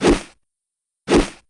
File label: pose